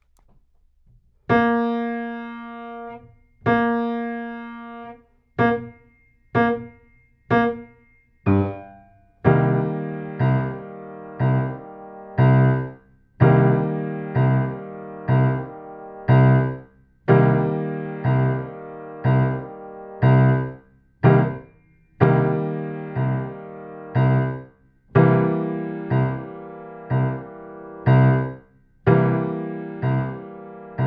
Bb 8 bars, sax

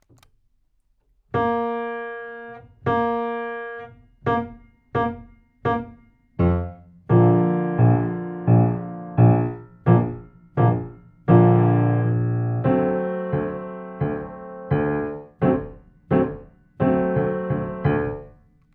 Bb 4 bars, sax

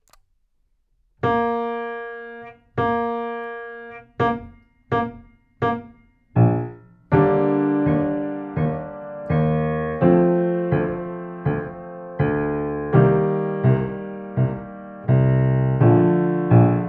Bb 4 bars x 4, sax

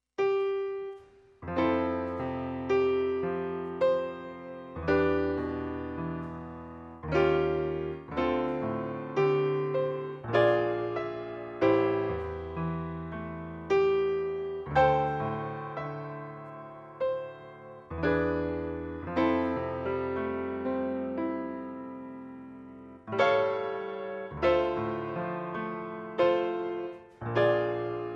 jazz waltz, sax

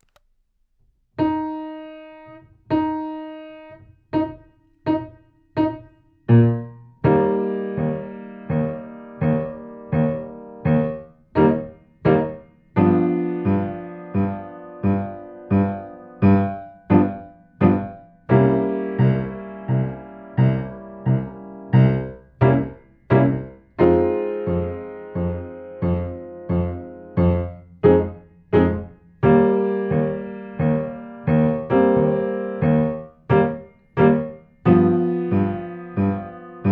Eb 8 bars, sax

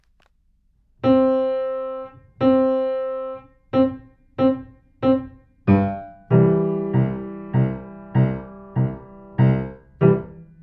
C 4 bars, sax

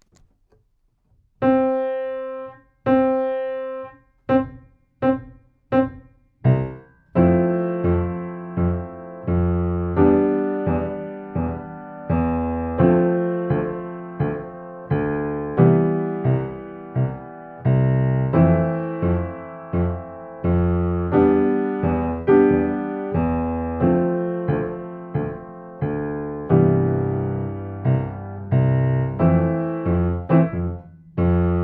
C 4 bars x 4, sax

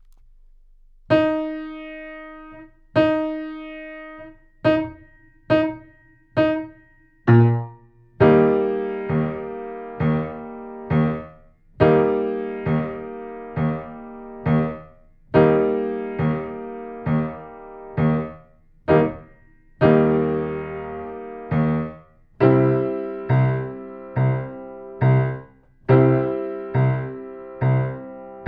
Eb 8 bars, sax; S F M R Di〜

